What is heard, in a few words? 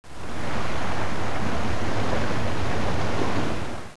Wave; Ocean Bound v1.0